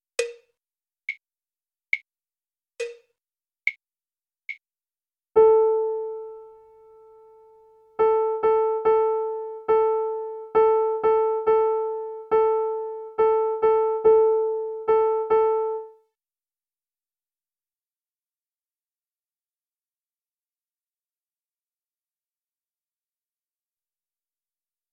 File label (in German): 6-8 Takt